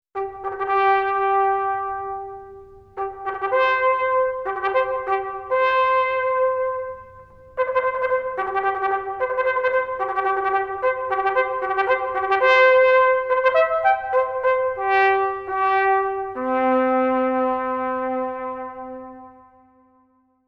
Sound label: Natural Trumpet